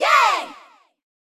yay Girls, cheer Readers

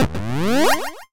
charge; Pajama Party

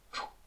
squid hurt; mobs; Mineclonia; mobs mc

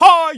switch; HeavenStudioPlus; lockstep

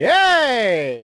"yay"; HeavenStudioPlus; dj School

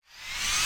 whoosh Forth Far; HeavenStudioPlus; Air Rally

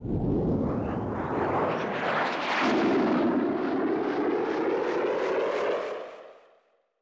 jet Long; HeavenStudioPlus; spaceSoccer

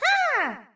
"Haaaah!"; sm64coopdx; char-select-extra-chars

